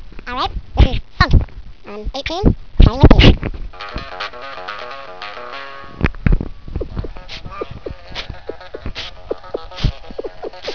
bass funk

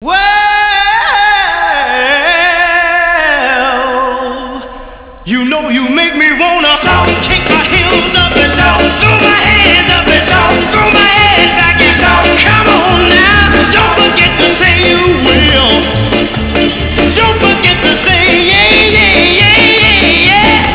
shout

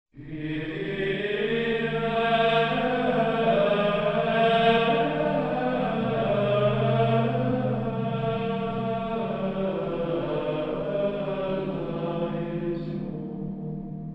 kyrie, latin mass

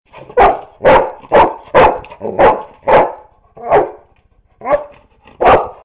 Linus Bark, golden

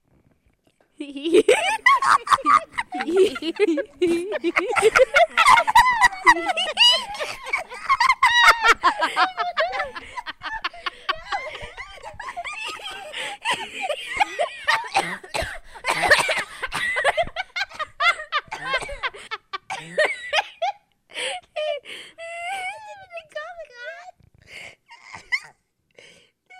Laugh attack

